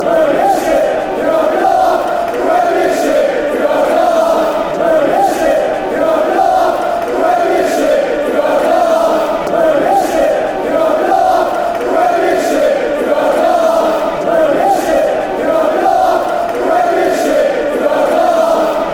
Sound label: yer having laugh, fanchants; premiership